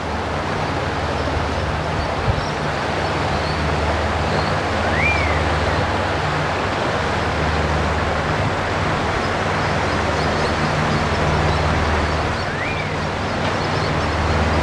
lake top of creek; Coburg Lake; Coburg Lake 2026

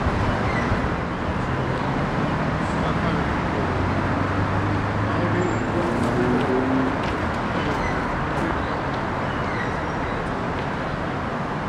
lake pentridge entrance; Coburg Lake; Coburg Lake 2026

